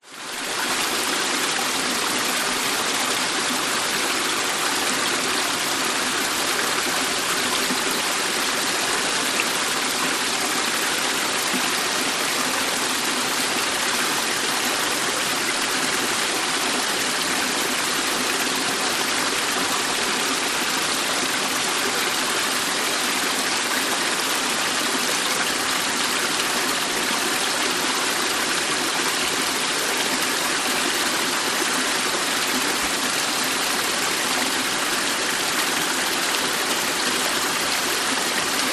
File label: nature waterfall small light flow creek rainforest